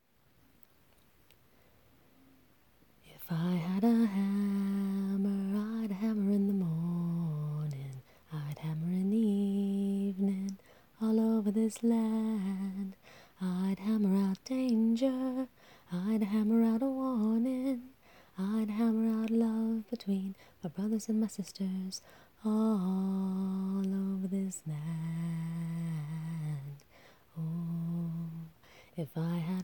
cover a capella, F

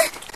Grunt; Lewd SFX

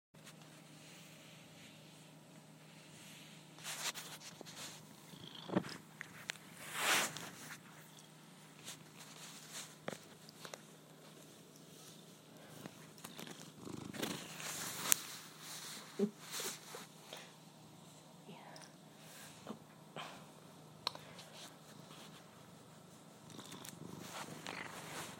Real purrs

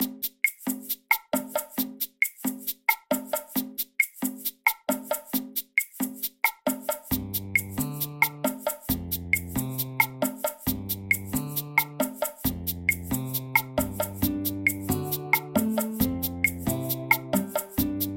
intro music

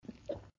Swallow; Lewd SFX

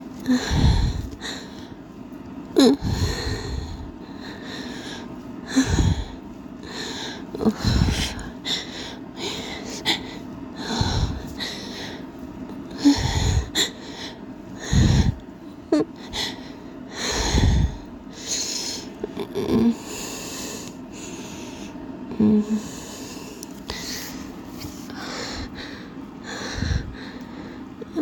Failing to hold the moan

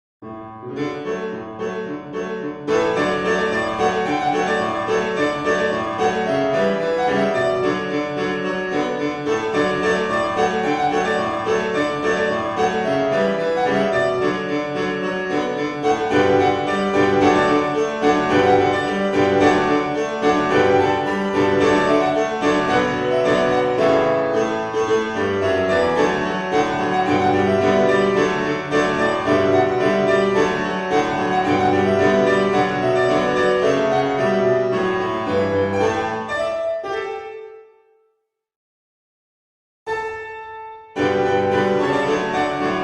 Piano Cover

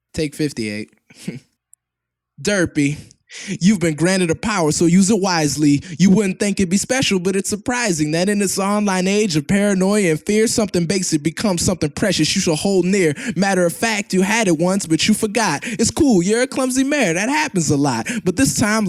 vocals only 97.5 BPM; Acapellas

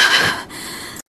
Pant

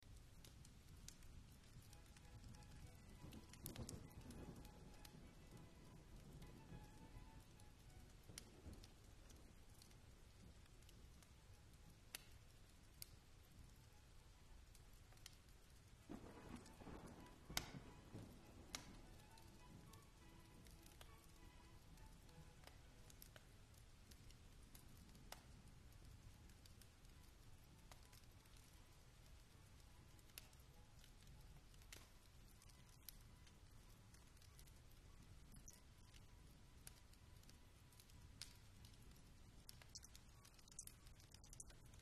Keyboard, fireplace, thunder, classical

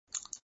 Suck; Lewd SFX

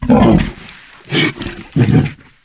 Bullsquid idle; BUGBEAR

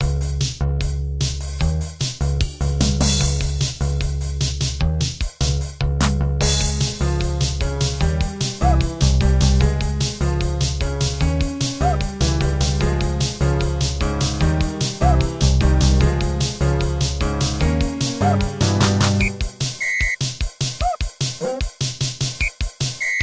awkwardly epic

